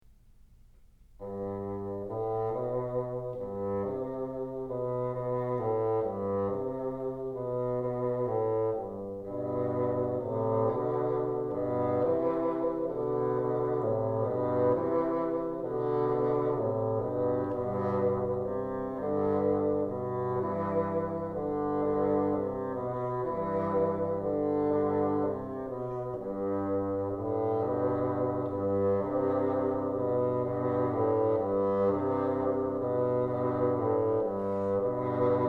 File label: duet, bassoon